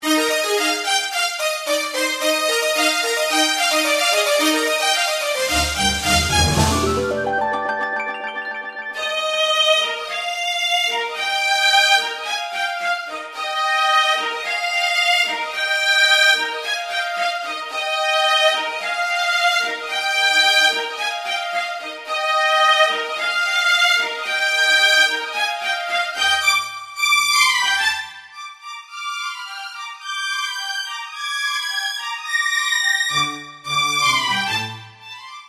jingle

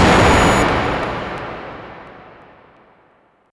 hit

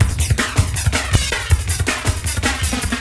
kick beats

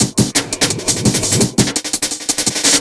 breakbeats

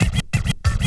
scratch

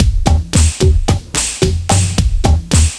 Techno

